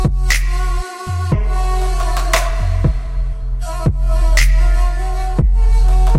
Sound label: Arabic Instrumental